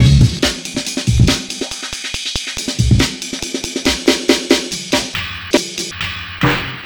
Amen Breaker 140Bpm; vst fx bundle

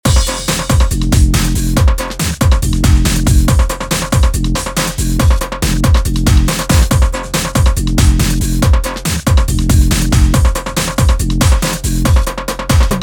Break, drum beat; Drum Blaster: The Ultimate Beat Generator, Sampler Plugin for Modern Producers

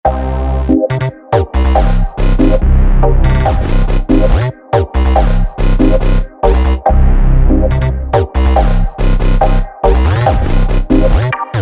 Underground Bass; rave generator bundle

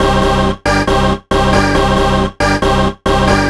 Rave; Rave Generator 3; 90’s Rave & Techno VST/AU/AAX/CLAP Plugin

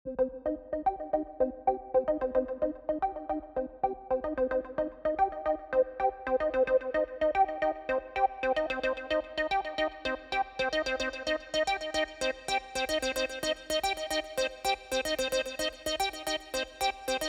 Sequence of the 80, retro 80s synth wave; retro 80s synth wave presets abx3 vol1

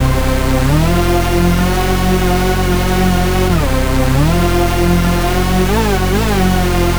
Stacked Hoover; Rave Generator 3; 90’s Rave & Techno VST/AU/AAX/CLAP Plugin

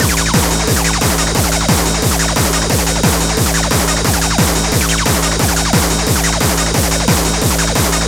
Loop Turbine; Hard Acid Presets for ABX3 – 128 Explosive Acid, Hardcore & Tribe Sounds

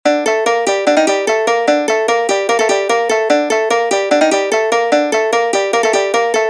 Electronic Guitar Dry; distoblast

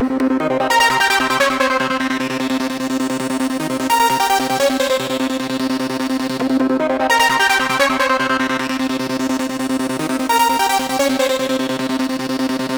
Lead, trance; Carbon Lead; abx3 trance presets vol2

